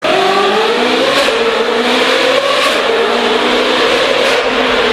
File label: formula exhaust